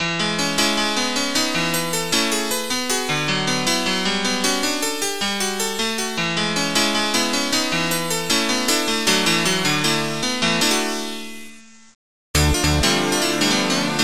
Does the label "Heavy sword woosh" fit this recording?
No